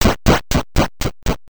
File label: Down Stairs 8-Bit